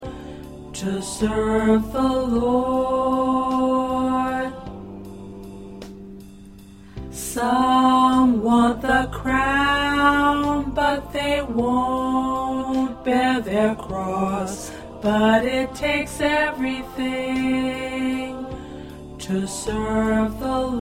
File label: 2nd Alto